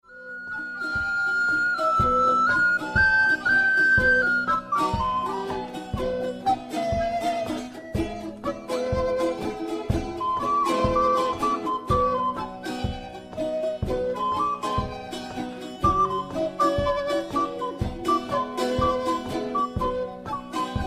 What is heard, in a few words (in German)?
Trio blockflöten blues